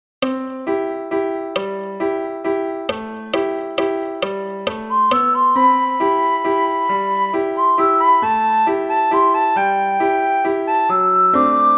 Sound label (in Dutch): sopraan; Flierefluiter 6; Up Movie